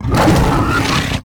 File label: CANNIBAL ATTACK